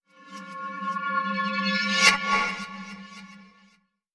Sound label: metallic sweep suspense